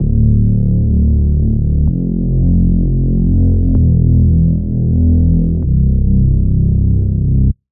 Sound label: Bass; Meteoric Devastation; Routed Sound; Vital Expansion - Star Scavenger Vol.1